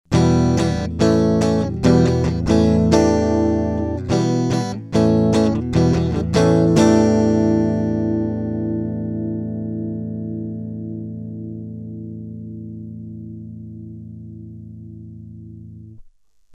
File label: gtr vint 0 comp gtr